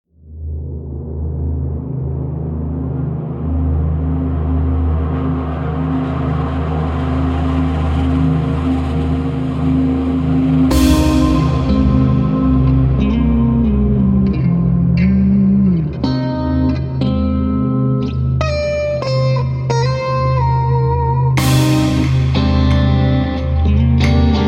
Desert Drums

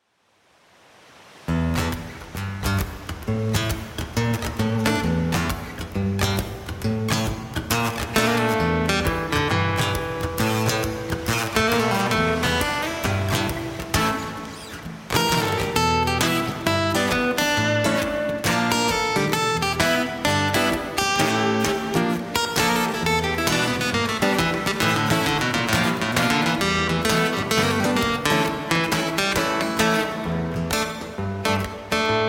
Guitar

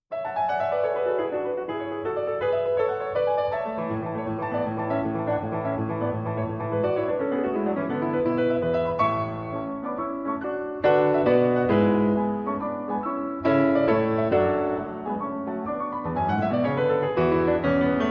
Partita for Piano, Giga; Petrassi

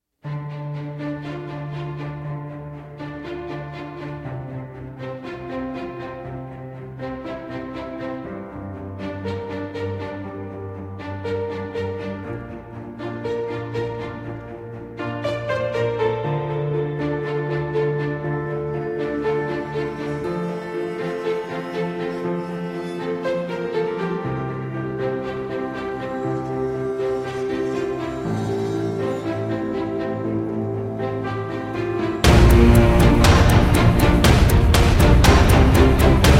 Instrumental

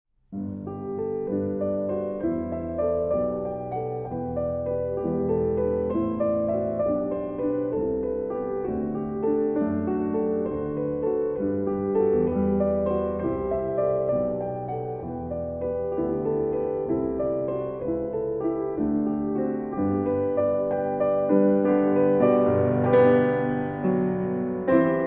Instrumental Version

